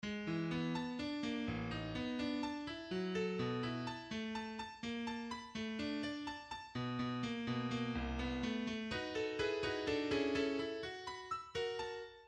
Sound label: music fast